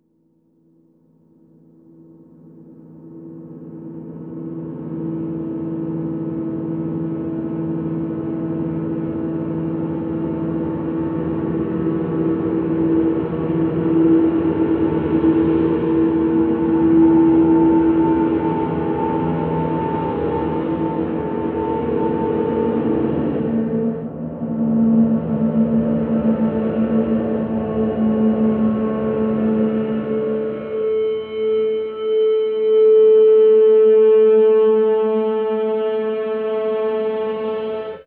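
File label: feedback storm, Alien Guitars; Big Fish Audio; SONOR STORM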